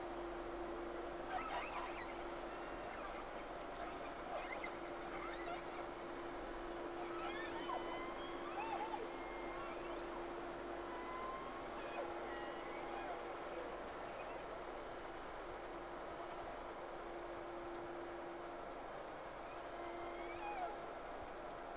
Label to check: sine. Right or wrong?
Wrong